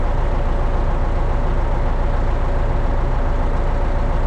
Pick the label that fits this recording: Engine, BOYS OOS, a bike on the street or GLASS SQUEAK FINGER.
Engine